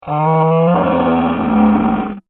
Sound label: Dinosaur Groan, Creature; Animal; Dinosaur Sound Effects; BlastWave